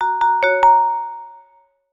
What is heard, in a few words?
Talk, notifications; Lenovo; ZUI 13